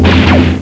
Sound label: laser; Uprising